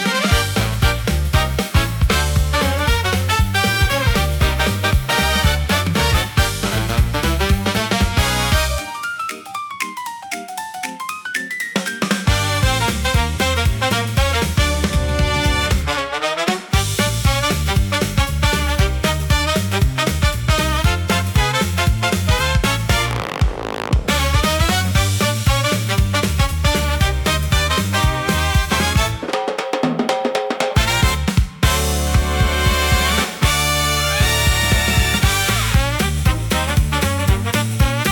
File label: Brass Bounce, electro swing pop